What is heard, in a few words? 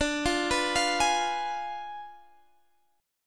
D Diminished 7th D down Guitar Drop D